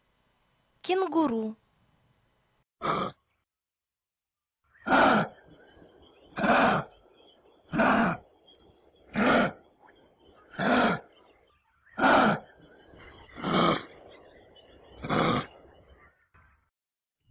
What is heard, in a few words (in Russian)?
Кенгуру